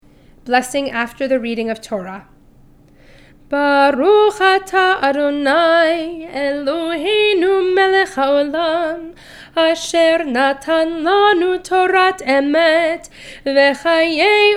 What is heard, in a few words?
Blessing After Torah Reading